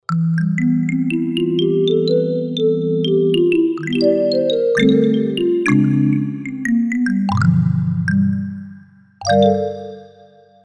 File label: Syn Mallet, Korg M1; Factory preset examples